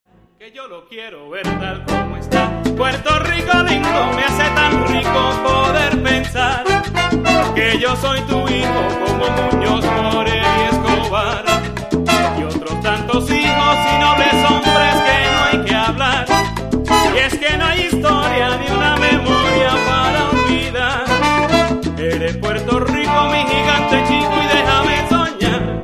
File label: Latin Salsa